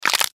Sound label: Neck Break Human Impact Martial Arts; Combat Voice; Kung Fu Karate Military; Warfare; Blastwave